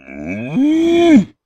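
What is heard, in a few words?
minotaur random, mobsounds; server-nalc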